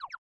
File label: sent message failed; Elephone U Pro